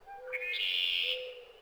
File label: distant bird; WAR